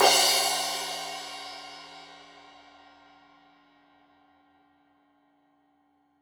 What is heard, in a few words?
Crash 15in L edge, Cymbals Stereo